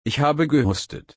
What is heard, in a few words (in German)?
husten